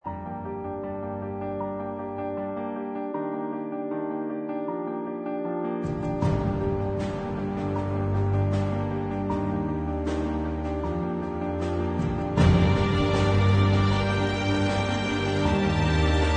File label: Dramatic Intro Loop